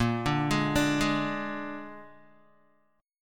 A sharp 7th A sharp, Guitar; A#7; arpeggiated; 5,5,8,7,8,x

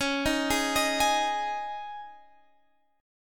Db Suspended 2nd Db Guitar Standard; 21,18,18,20,21,x; arpeggiated

